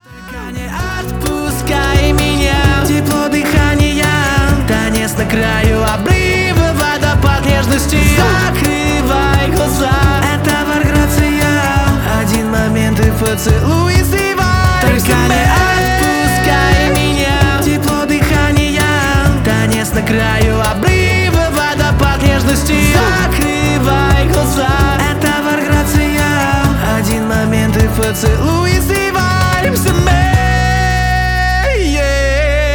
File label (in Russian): vargracija acoustic